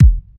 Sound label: Mita Step; Game rip; MiSide